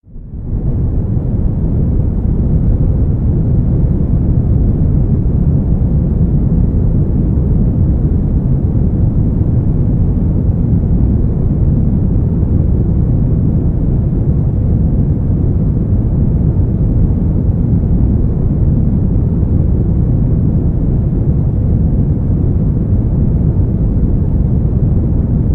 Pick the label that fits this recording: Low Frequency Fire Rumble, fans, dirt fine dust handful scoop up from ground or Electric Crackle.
Low Frequency Fire Rumble